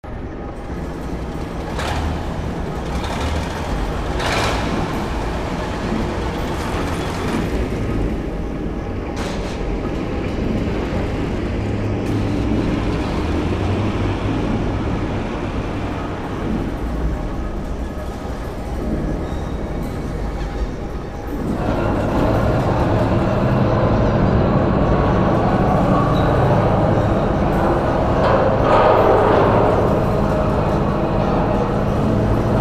Amb Warehouse Ext

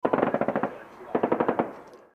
Gunfire

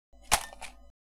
On full of trash

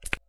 On click

